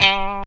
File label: GTR PIC; E-Lab; X Static Goldmine 2; akai